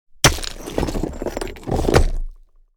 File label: Big stones dropped and dragged on a pile of rocks